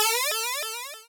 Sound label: Cry